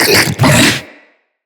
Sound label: Attack; Infested; Diseased Ancient